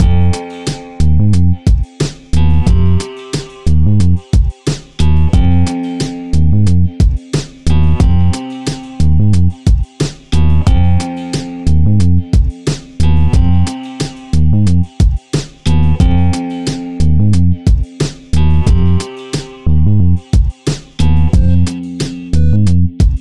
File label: Clavichord Boombap Hiphop